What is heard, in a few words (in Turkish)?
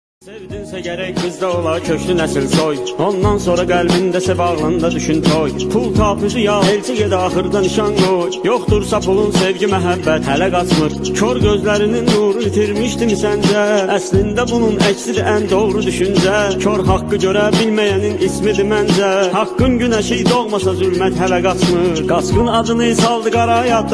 Azeri Trap Muzikler